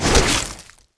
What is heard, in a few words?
Weapons Swing